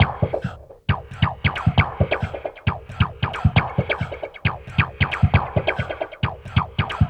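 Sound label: drum loop 135, left; House; Best Service ProSamples vol.10 - House; AKAI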